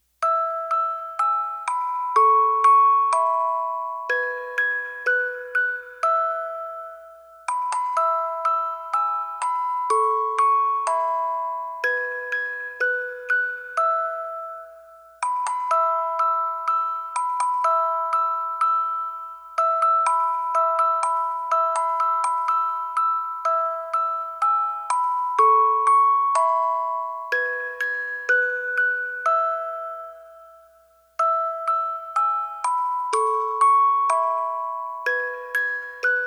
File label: music box version; Old McDonald; Tiny Tunes; Narrative Mind